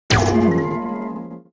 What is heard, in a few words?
Candy stick effect